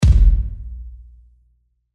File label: bassdrum